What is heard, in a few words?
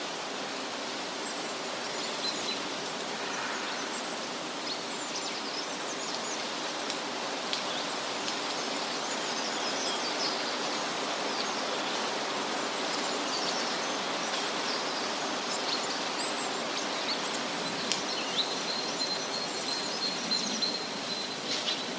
day ambient